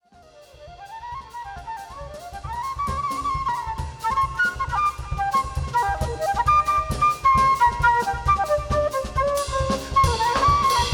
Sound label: Drums Flute